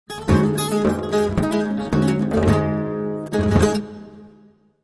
Arabia Music; Civilization Revolution; Xbox

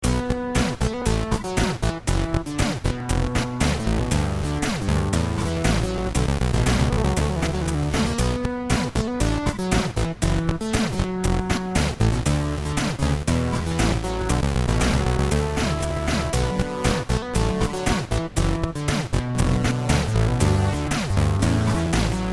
gameplay loop; Strong Bad's Cool Game for Attractive People; mathkickers

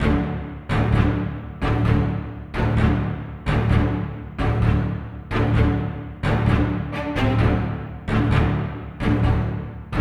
Combat; The Witcher 3 Wild Hunt; Skellige; Ice Giand Island Combat Layer 02 v1

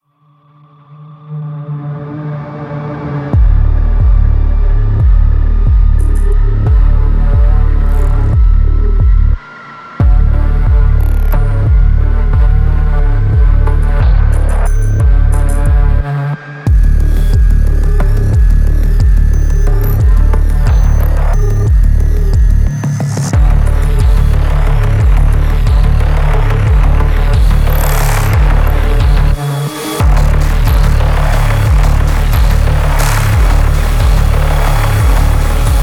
Main Ambient; Doom Eternal; PS4; Stadia; Xbox Series X/S; Blood Harvester

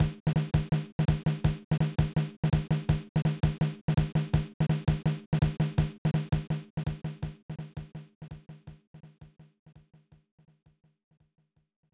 Drumbeat; fun n games 1994 snes

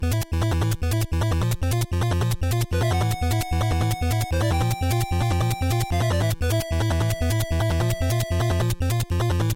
Boss Battle Theme Loop, nes; Extra Mario Bros.; Super Mario Bros. hack

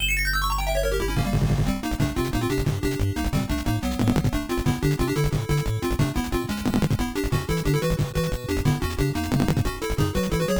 Boss BGM; Abadox; NES

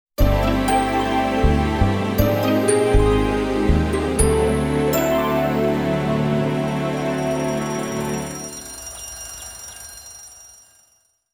JINGLE Pia Over; NiGHTS into Dreams perfect album

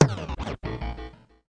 player death

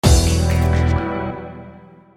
Retry Jingle; Friday Night Funkin Crystal ost pc mod gamerip

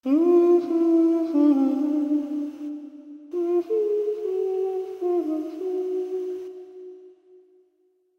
humming; Dark Fall The Journal

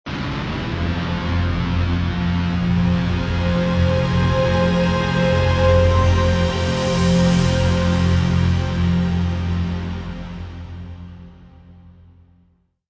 Modern Foreboding; Civilization Revolution; Xbox

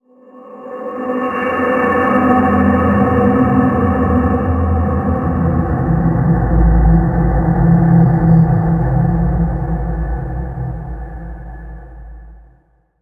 environment stinger

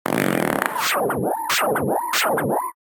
projectile

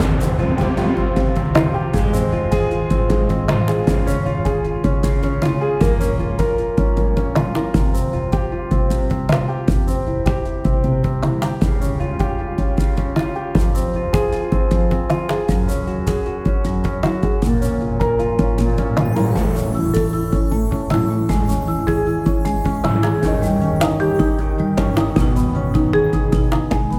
Perc Piano

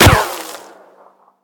Gunshot Miss; Devour; The Town

